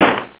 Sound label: gun, machinegun